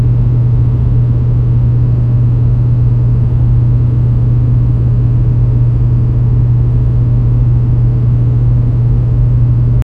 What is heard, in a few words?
vent knock